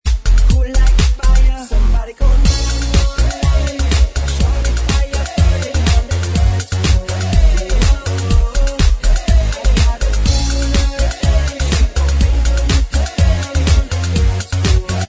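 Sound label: backing vocals, backing tracks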